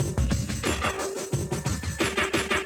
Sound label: BACK BT, Hip Hop, Backbts; LiveAct Pool